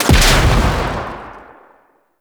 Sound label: Heavy Weapon Summon Explosion; Warframe; Archwing; Arch Cannon